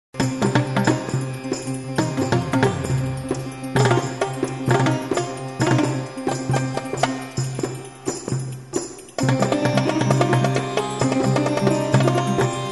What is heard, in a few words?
sitar dum